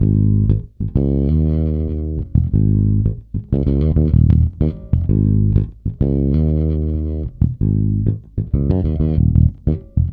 G#, bass; Spectrasonics; Bass Legends

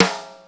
Classic Snare, Ensoniq ASR-X Pro

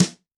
BETA Sn, Yamaha DTXpress-II snare

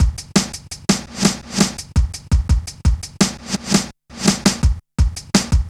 Step Ez, Drum Loops, Jungle, 168.5

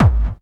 KICK hardcore, Techno Trance DRUMS

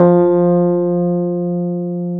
PNO, Electrics; Club-50 - Foundations Roland; FRhodes2

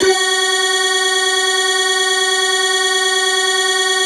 synth clav

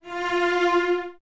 Cellos, String Ensembles; Miroslav Vitous; LINKS ORCH

